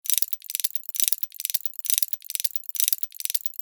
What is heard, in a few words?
Small unusual clock mechanism loop; steampunk; gfxsounds